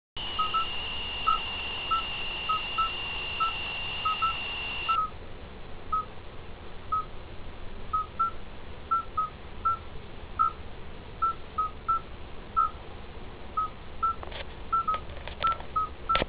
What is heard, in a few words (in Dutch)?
Tuin Geluid Padjes